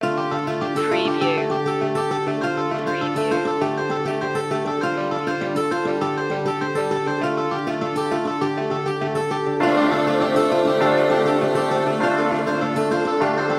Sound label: tribal trip hop